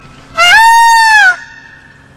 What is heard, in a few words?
Peacock Calling